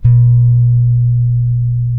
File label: Bass, LATIN, C 4; Spectrasonics; Bass Legends; ROUND LATIN